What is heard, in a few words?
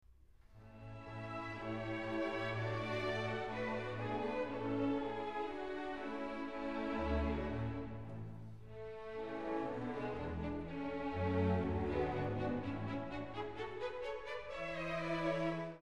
Piano Concerto 1st movement; Mozart; Piano Concerto No. 23